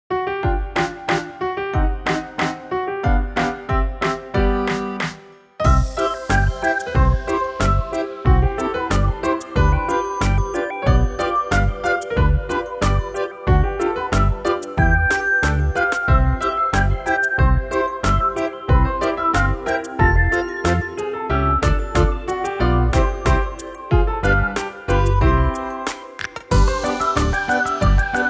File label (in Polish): Polka; F. Rybicki